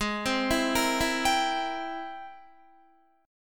Ab Augmented Major 9th Ab Guitar; arpeggiated; Ab+M9; JGuitar

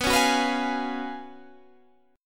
F sharp Major 7th Suspended 2nd Suspended 4th, B

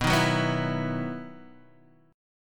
E Major 7th Suspended 2nd

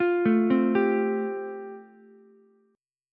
A Minor 7th Sharp 5th A, Ukulele; Am7#5 arpeggiated; JGuitar

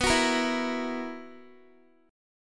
B Major 7th Suspended 4th B down Guitar; strummed

